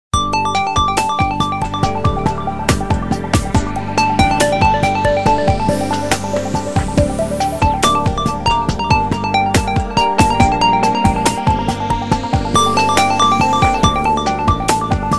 Popple, ringtones; Coolpad 5010

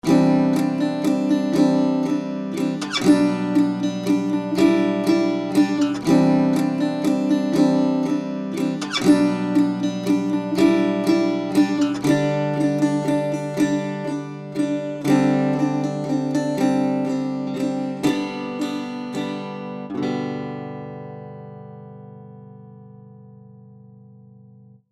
guitar, ringtones; Verykool; S757

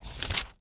Flicking L to R; Pantech; Discover P9090; ui

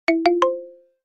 Default SMS tone; 360 N6 Lite

